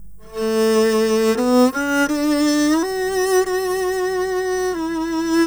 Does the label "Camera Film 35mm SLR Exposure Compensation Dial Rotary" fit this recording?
No